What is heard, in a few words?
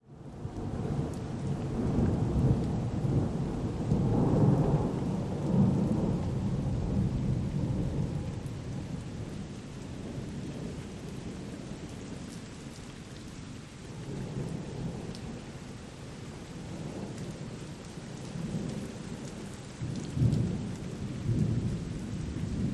Lightning Bolt Thunder Crack; Storyblocks Audio